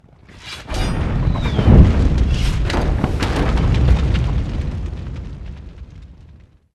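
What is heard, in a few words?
twilight invasion ambience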